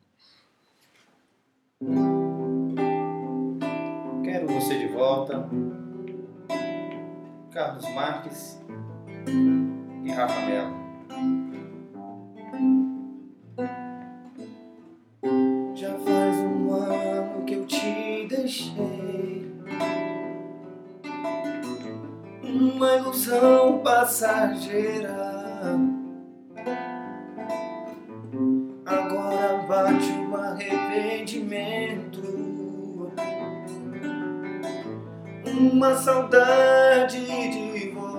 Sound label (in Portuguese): voz e violão